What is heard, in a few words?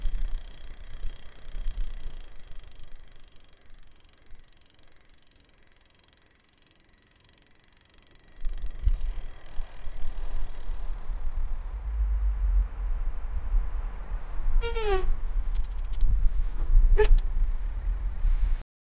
a bike on the street